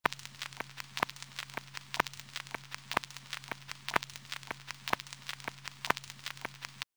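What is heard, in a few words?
scratch; device sounds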